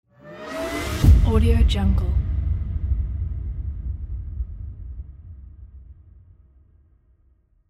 whoosh sfx

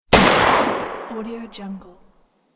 antique shotgun shot

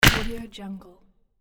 hit wood

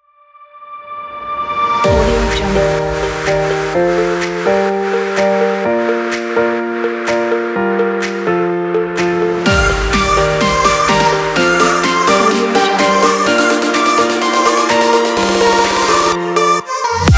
party pop; alex-makemusic

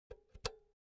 object electronics power cord insert soft

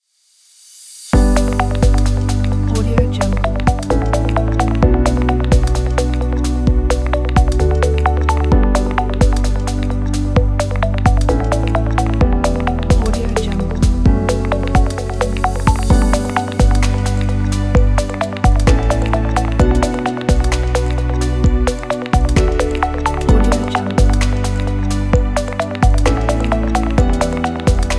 ambient and calm

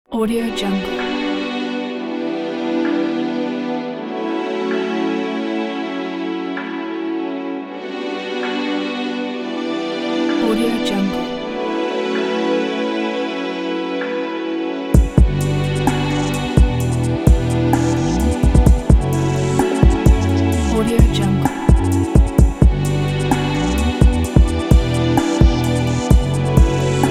drone footage kit

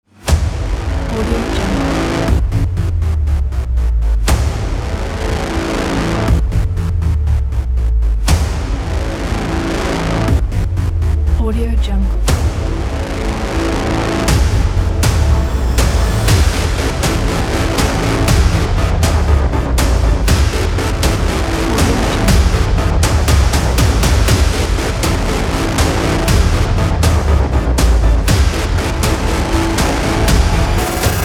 rising distorted trailer

